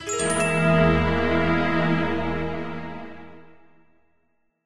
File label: Mystery; MapleShrine; mkxp; gameasync